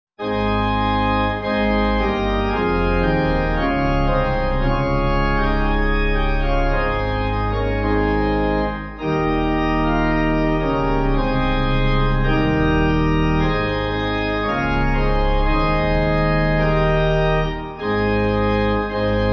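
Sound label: Pipe Organ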